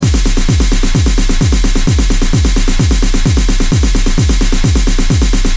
drumloop dry

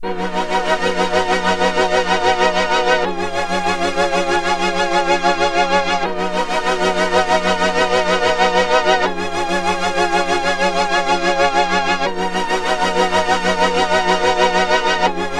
stringtron devtronix

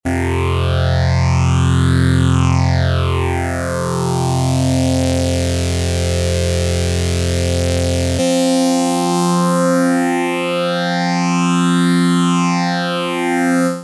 master phaser

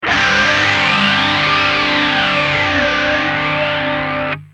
gtr

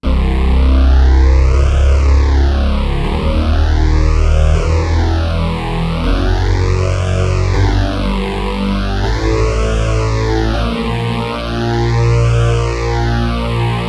octa scale pt9pro

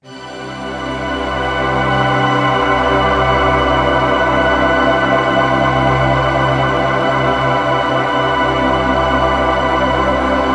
poly pad e1005

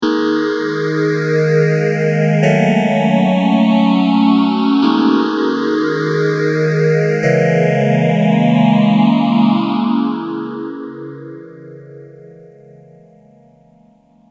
m1000 boss ph3

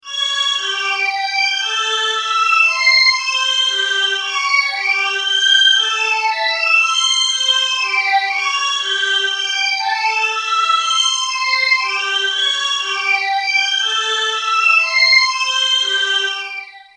strings, phaser; x5dr; systech phaser2

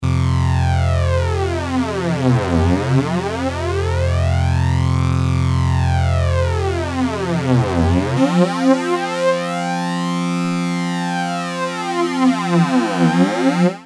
prodigy ibanez fl99

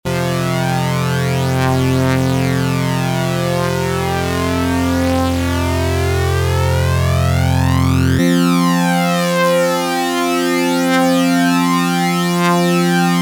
prodigy poly flange

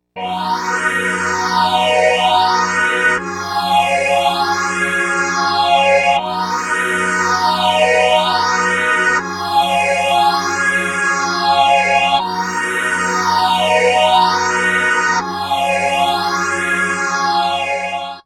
stringtron

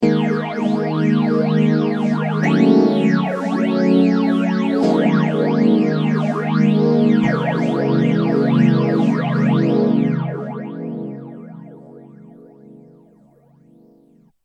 Mutron biphase

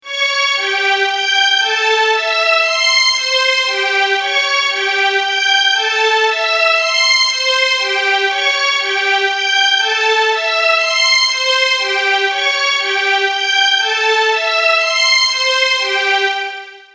strings A dry; x5dr

